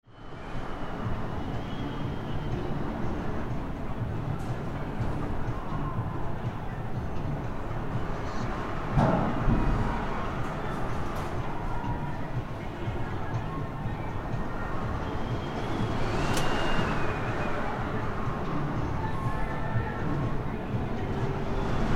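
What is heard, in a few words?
circle orientation, Fukushima University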